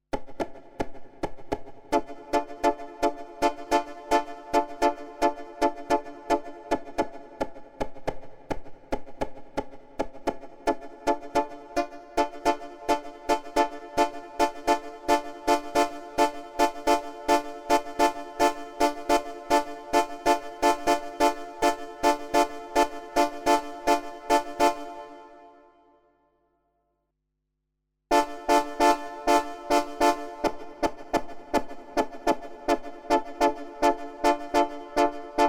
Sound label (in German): Hüllkurventest